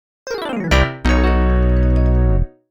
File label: you lose; Penguin Wars; PS4